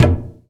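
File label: SP-12, drum machine; Best Service Gigapack 2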